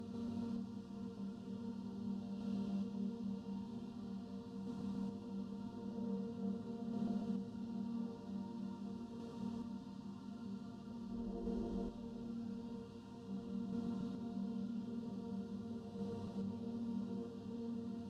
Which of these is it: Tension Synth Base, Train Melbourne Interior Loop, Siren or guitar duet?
Tension Synth Base